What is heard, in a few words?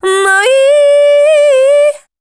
voice spectral, ly short